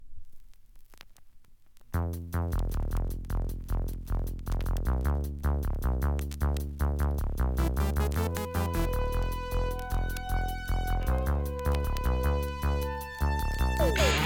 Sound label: (Instrumental Mix); Tuff City